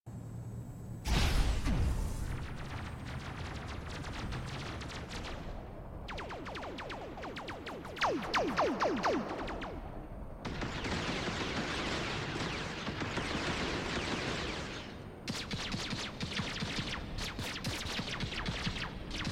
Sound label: Laser Enemy Audio; Robocraft